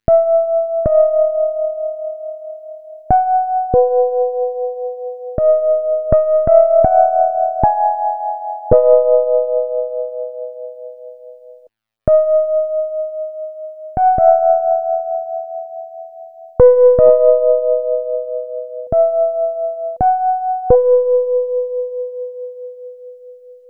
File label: Flitty Piano, Electro Lounge; Sonic Foundry (Sony Creative Software); 080 Pinky